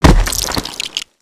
wall smashable; avant gardens

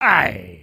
Recoil; creatures organic

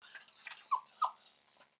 Pet Dog Small Idle; creatures; pets; Lego

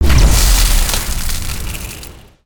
Ice Tornado End Blast; Spinjitsu

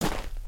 Footstep Dirt; creatures pets

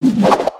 gun spin; weapons; aronwk collection; ndaudio

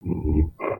Pet Buffalo Idle Look; creatures pets; Lego

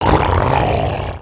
Tentacle Monster Growl; destructibles

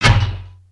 Banana Gun Fire; weapons; aronwk collection; ndaudio

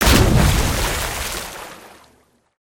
water splash close; destructibles

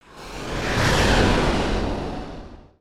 Cam Select to Login

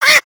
Crab Angry; creatures pets; Lego

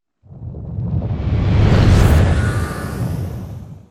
asteroid Path

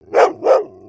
doberman bark

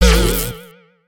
wand hit; weapons; aronwk collection; ndaudio; Repulse Wand

